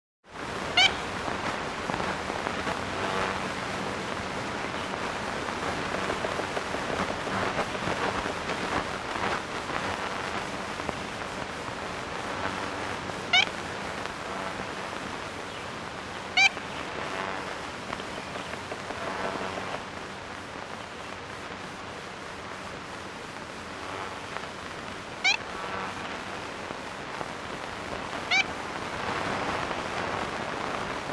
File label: Ivory billed Woodpecker Campephilus principalis